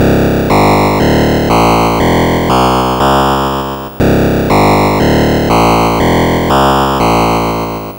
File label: Atari 2600 Bass, chiptune